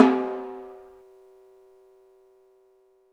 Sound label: Timpani p; Roland M-OC1